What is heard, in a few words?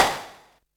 00s trebly steel snare drum a key, Royality free snare sound tuned to the A note